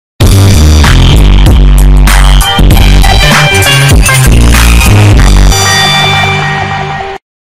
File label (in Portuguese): mission passed respect musica saturada; gta san andreas